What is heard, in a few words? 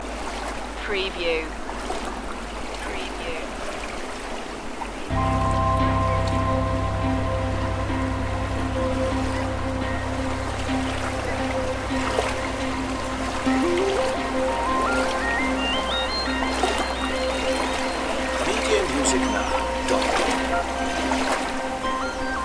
seaside fx; at the seaside loop